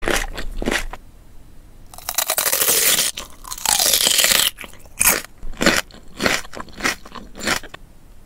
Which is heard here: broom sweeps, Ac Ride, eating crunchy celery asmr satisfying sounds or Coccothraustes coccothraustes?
eating crunchy celery asmr satisfying sounds